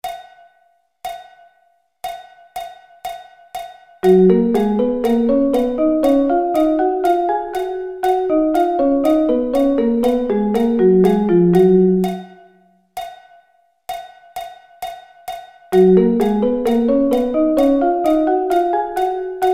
G Thirds

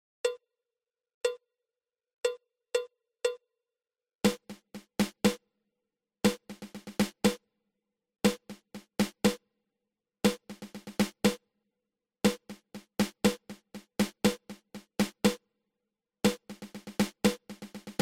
Six Stroke Roll

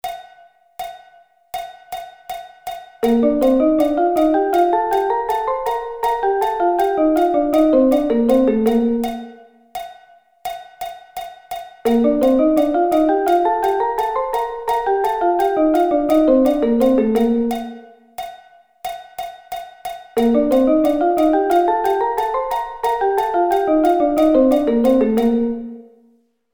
Bb Thirds

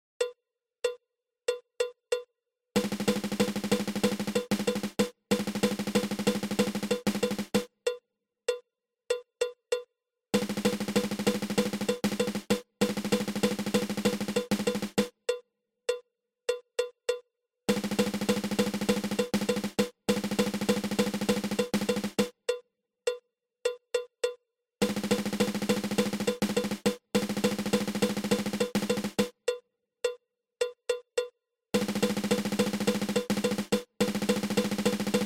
Single Paradiddle

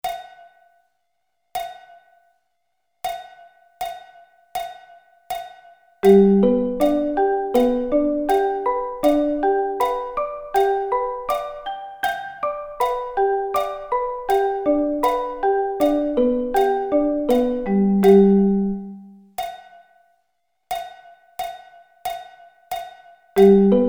G ex 6 Arpeggio Inversions

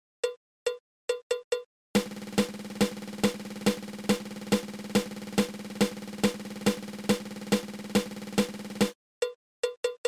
Seven Stroke Roll

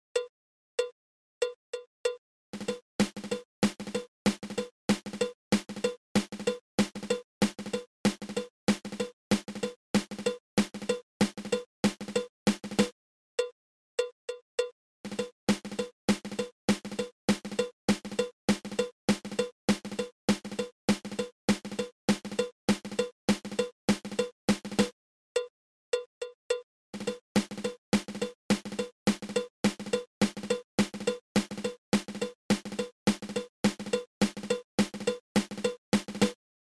Single Drag Tap

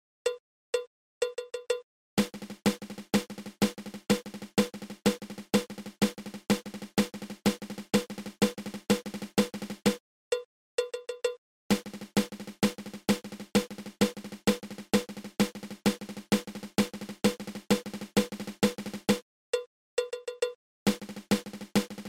Single Drag Tap triple

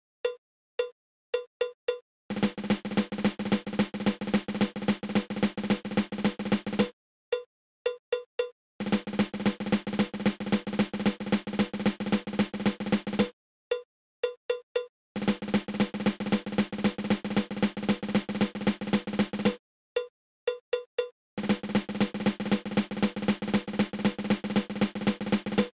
Drag

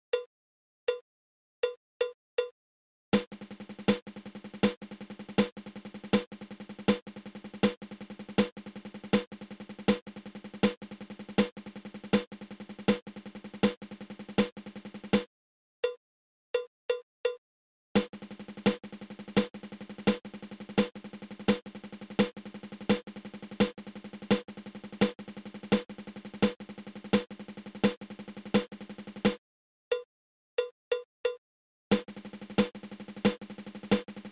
Seven Stroke Roll

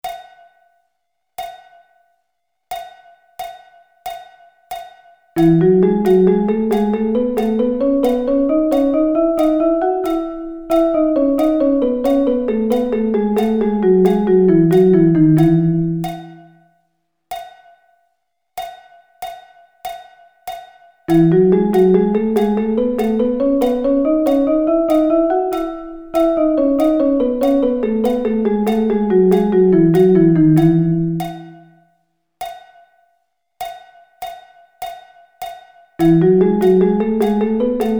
E Double Sticking

